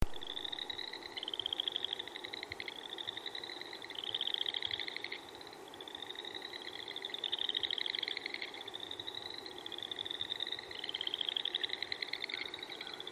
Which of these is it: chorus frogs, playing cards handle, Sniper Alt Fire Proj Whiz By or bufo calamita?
chorus frogs